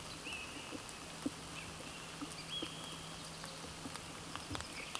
Haematopus ostralegus

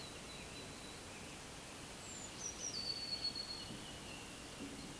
Parus caeruleus

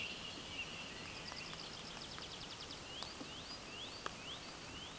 Anthus trivialis

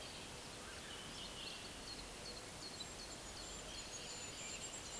Regulus regulus

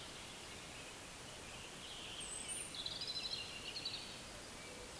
Erithacus rubecula

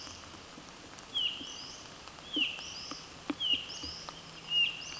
Pandion haliaetus

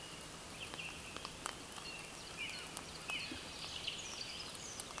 Pyrrhula pyrrhula

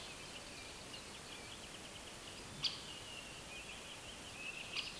Dendrocopos major

